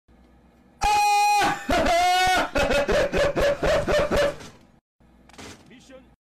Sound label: korean laugh